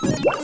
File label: virus hit; AIWars